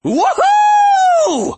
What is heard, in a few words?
woohooooo